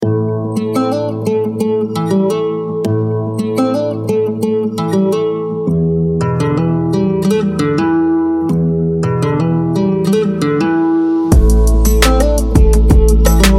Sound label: Classic Rodeo